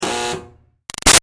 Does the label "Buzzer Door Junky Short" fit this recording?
Yes